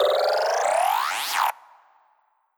Quetz Solaire Rise

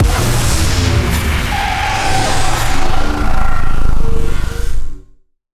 Blaster

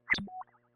Vase Activate Small

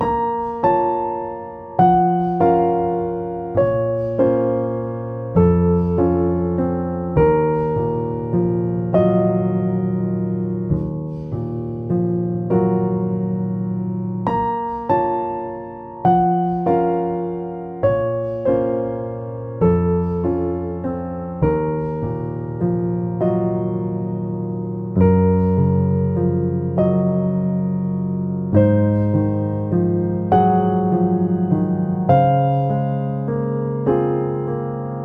BGM Lobby; starbites

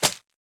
Arrow hit

